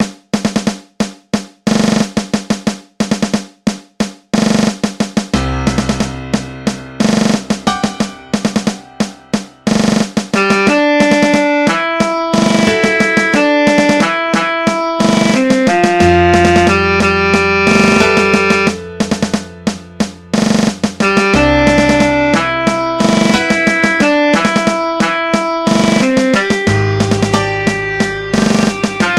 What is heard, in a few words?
Transition; Flagmaker